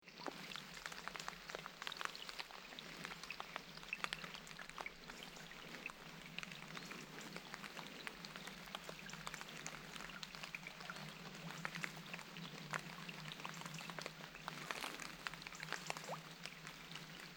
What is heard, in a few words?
mist suspension; misty atmos; field recordings